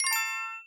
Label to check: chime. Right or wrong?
Right